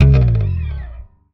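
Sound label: slap, bass; Xsample VOL-1; SLAP+THUMB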